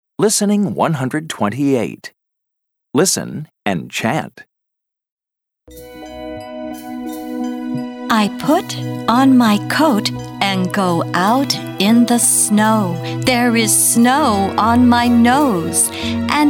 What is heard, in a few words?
Chant